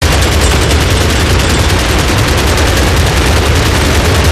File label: pendulumturret fireloop player; gow